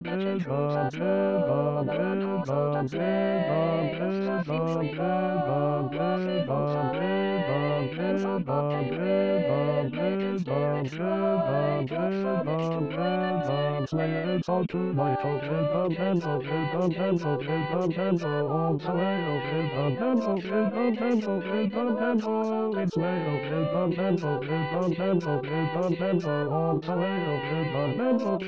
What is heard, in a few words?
Basses